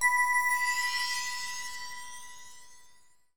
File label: click